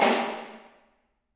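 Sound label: snare drum, Drums; Bob Clearmountain Drums I; SD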